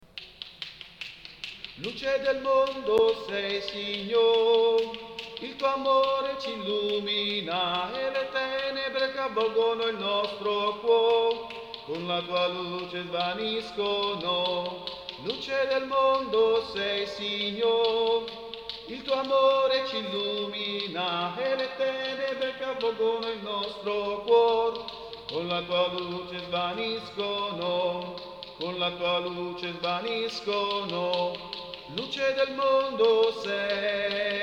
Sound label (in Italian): Luce del mondo (soprani); canti; registrazioni